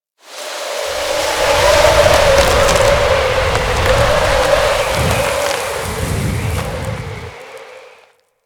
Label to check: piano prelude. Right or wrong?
Wrong